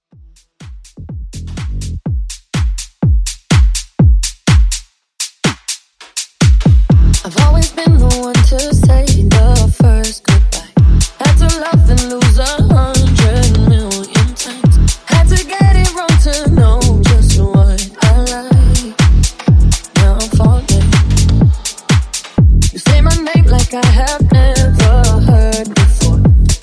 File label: Tech House Mashup